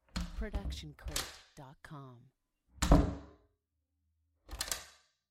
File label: house doors; soundscrate